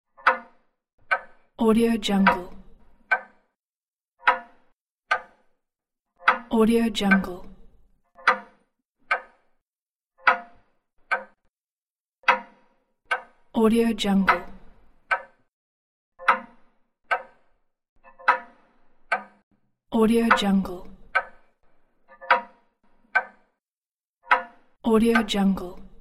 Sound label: old grandfather clock